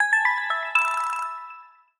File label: Clockwork, notifications; Honor; 600 Pro China